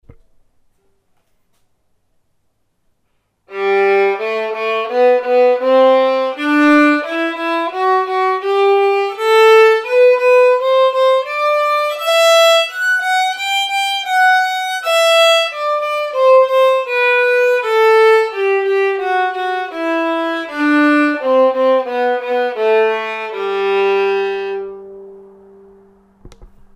sol ritmos, escalas; mi primer libro de escalas

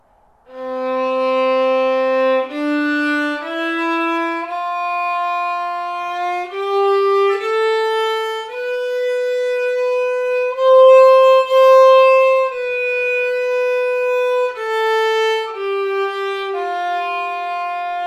do ritmos, escalas; mi primer libro de escalas

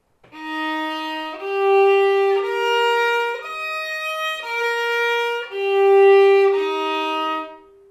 mi b arpegio; mi primer libro de escalas

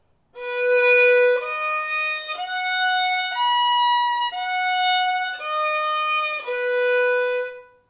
si arpegio; mi primer libro de escalas

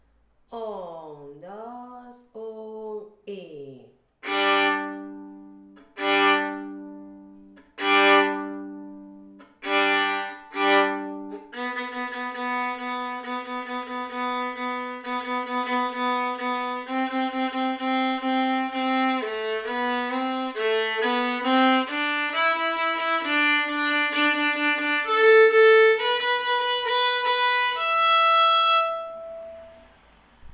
Danza china

